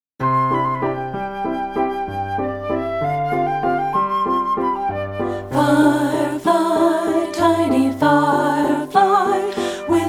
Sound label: alto